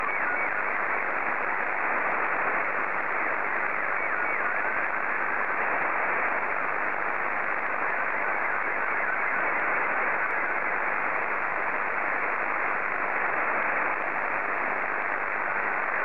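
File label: SSTV, Scottie 2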